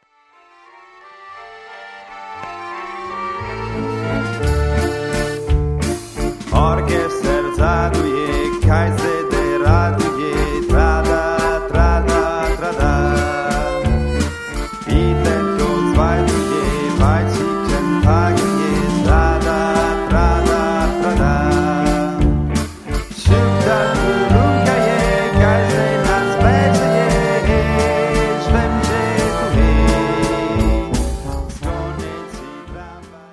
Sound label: Valse; Czech